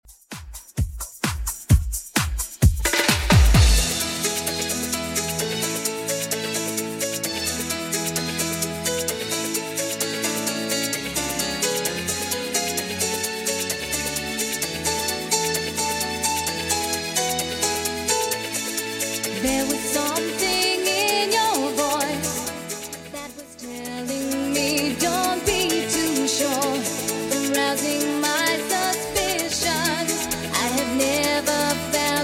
90s Redrum 130bpm

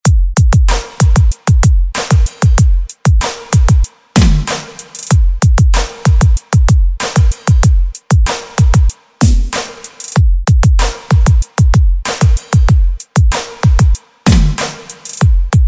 Twerk Remix Redrum

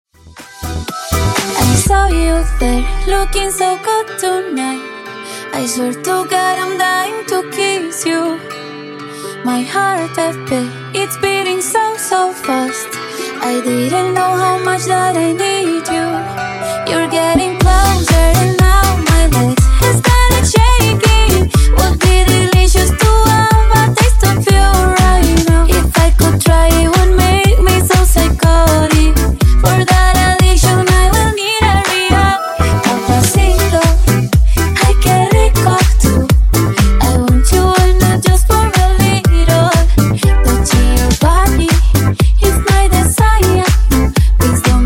Disko Funk Remix 122bpm

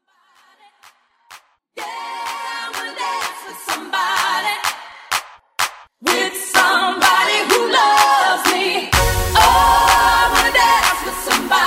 House Clap A Pella Intro

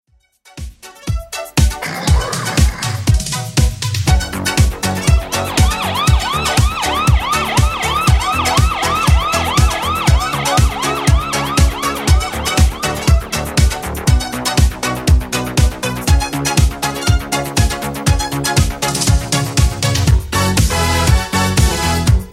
80s Redrum 120bpm

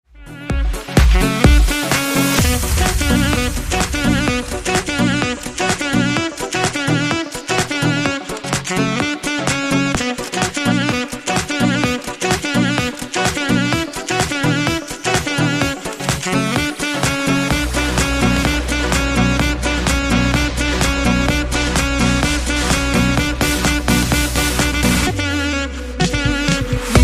saxo

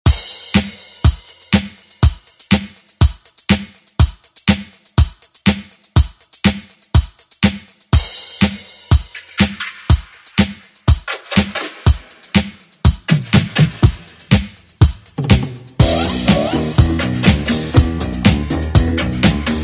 70s redrum; DJ Mhark

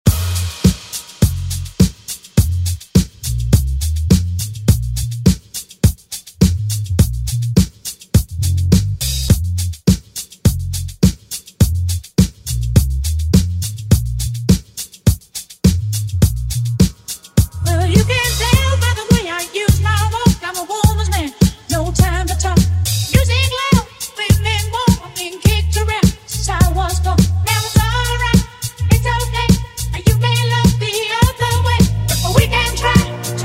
Oldschool Redrum; Deejay Hit

